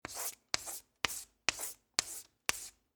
Office, Chalkboard, Write, With, Chalk, Short, Wheels, Classroom